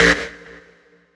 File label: synth rave C; lindy